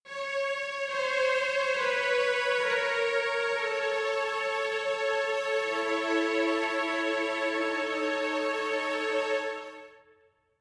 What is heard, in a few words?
strings